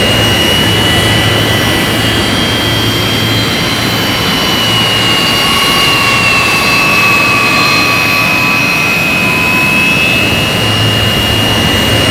jet engine damage close; Modern Warfare

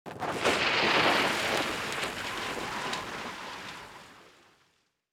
bomb material ground

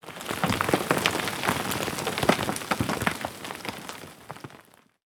impacts; World of Tanks

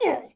Cbmn Hit Vox; Civ4 warlords

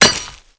physics knife dirt

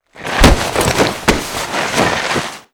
turnbuckle start front; Modern Warfare

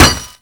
physics knife painted metal

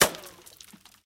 bullet dirt; guns; War Thunder

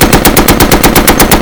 Inf Weapons; Red Orchestra 41-45

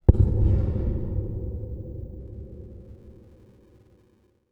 cave occasional

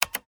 Cockpits Click Button On; DCS

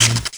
small discharge

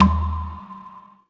cube shoot ball

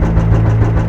idle loop

